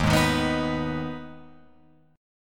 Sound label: Eb Suspended 4th Sharp 5th Eb Guitar Open F; Ebsus4#5 strummed; JGuitar